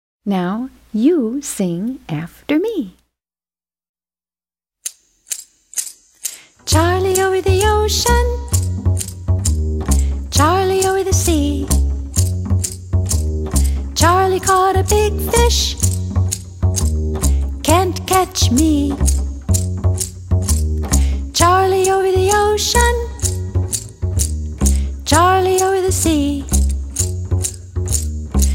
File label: charlie over the ocean sing-a-long